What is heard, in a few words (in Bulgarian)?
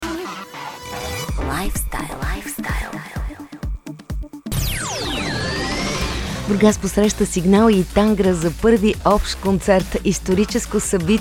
Signal; Tangra; Burgas